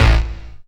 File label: hardcore, BASS, Techno Trance